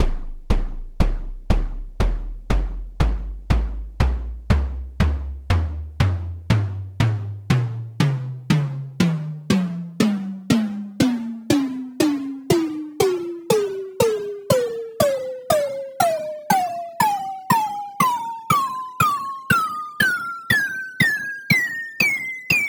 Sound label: melodic tom tonleiter